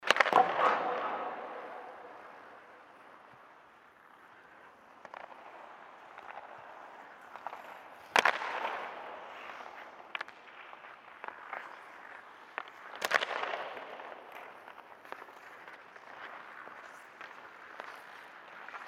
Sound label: failure shot